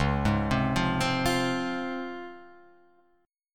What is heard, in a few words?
C sharp Major 7th C sharp Guitar Open F; C#M7; arpeggiated